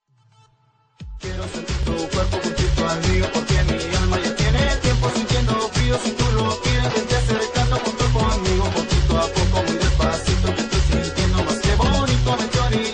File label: ringtone